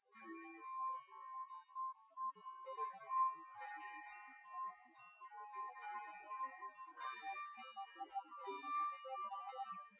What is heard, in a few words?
nodrums synthconv 250ms 5cm; SiSEC08; SiSEC underdetermined